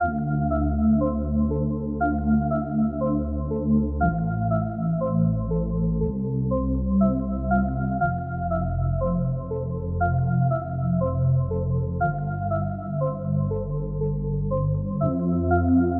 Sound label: Loop